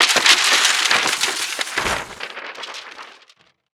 explosion paper fall; Explosions

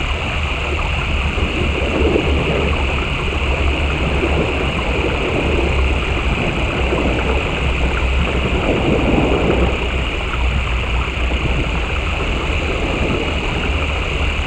vehicle sdv loop; veh sdv plr loop

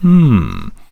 talk hmm

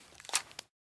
waterpipe shotgun insert shell; Rust

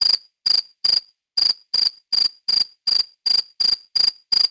crickets; World of Tanks Blitz; ambient milbase

